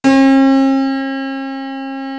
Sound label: JD piano